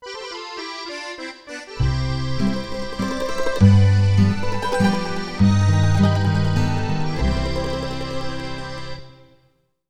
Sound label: Stereo - Seafoods (Interior) (Stinger); Stereo - Sublime Seafoods (Interior) (Stinger); spongebob squarepants employee of the month